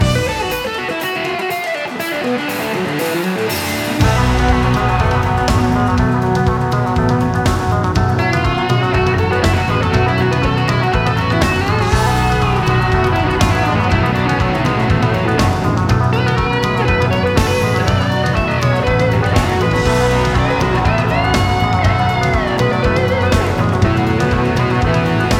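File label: Pensa, guitars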